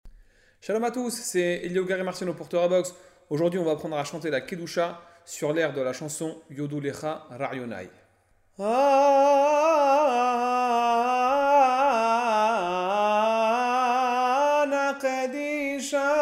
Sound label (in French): kedoucha sur l air de yodou lekha raayonai, tefila